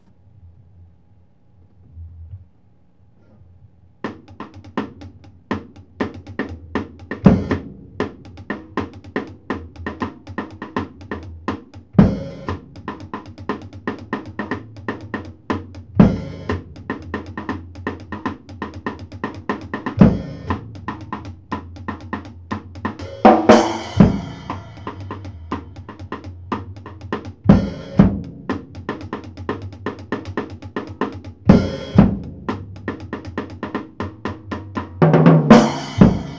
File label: beat complex funk rim 120, acoustic